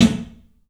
club snare, 3D Drums; 3D Sound

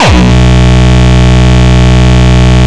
Longkick, kicks

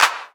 clap mid curves; M8

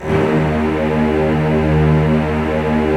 STR VCSF, Roland LA Composer; Roland - LA Composer vol. 4

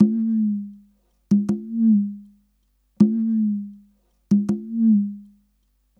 Slides 080bpm, African Drums, Conga Slides; Quazibeat; African Secret

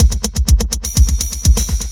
Loop, Roland; Sound & Vision - Gigapack I

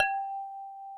HARP G4; Yamaha VP-1; Music Store; HARPOPHON

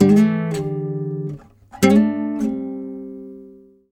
FOURTH CHOR, Nylon Guitar

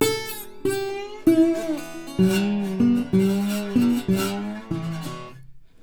Blues Guitar Samples; ILIO - Fretworks

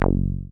SYN 303, Roland TB-303; Sound & Vision - Gigapack I CD 2

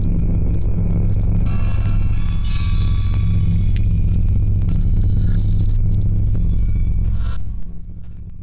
loop, techno atmos

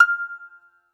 BAGLAMA F0UR; E-MU Producer Series Vol. 7 – Old World Instruments

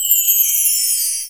Tree of Bells; Mo' Phatt; Digital Sound Factory Mo' Phatt

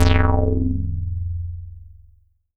BASS SH09 F0, Synths, Roland SH-09, Bass

